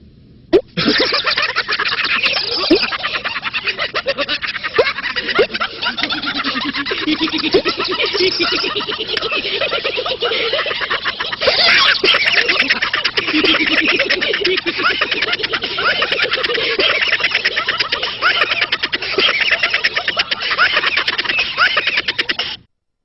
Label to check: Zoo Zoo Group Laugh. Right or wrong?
Right